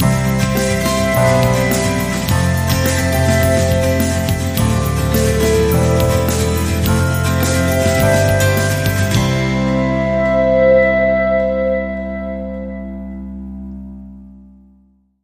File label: Sting Upbeat